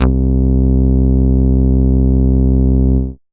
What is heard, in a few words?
Moog Bass, Dance Synths